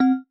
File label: marimba glasses; ゆめ2っき